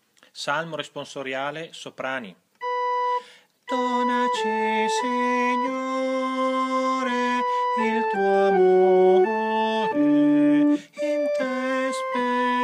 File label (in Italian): Soprani; Salmo; Ferrara